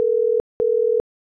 ringback tone hk; i OS; iOS10; nano

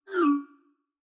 Messages Incoming Haptic; i OS; iOS10; nano

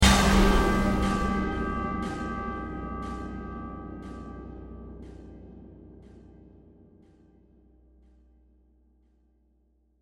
Start a Timer; Millionaire Soundtrack; US Clock (2008)

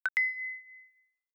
Workout Saved Haptic; i OS; iOS10; nano

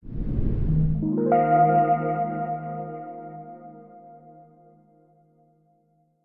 Phone a Friend Animate; USA Clock Format; Millionaire Soundtrack; US Clock (2008)

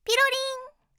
pirorin; Windows; Madobe Nanami

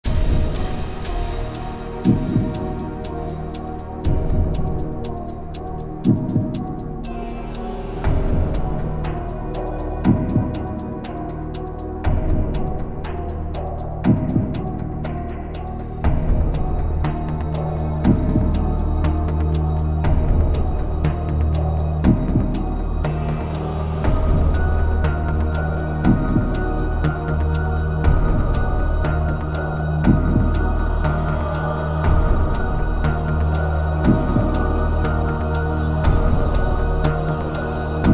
Clock; Millionaire Soundtrack; US Clock (2008)

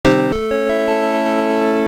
Macintosh IIcx, Death Chimes

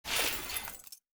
Windows Recycle

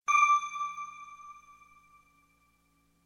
Lifeline Ping; USA Syndicated; Millionaire Soundtrack; US Soundtrack (1999 - 2008)

